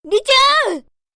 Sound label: attack; lace